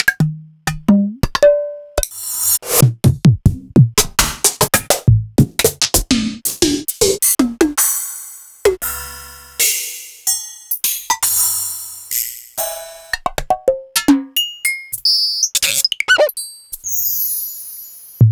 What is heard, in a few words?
Korg Triton hip hop kit